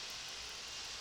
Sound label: Background Noise